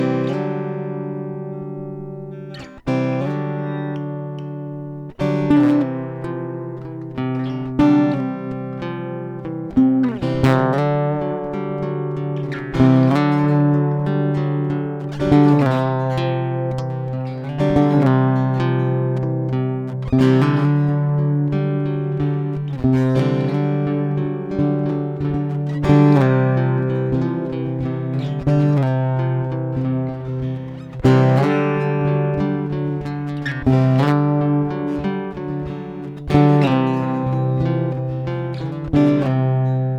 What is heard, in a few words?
sliding acoustic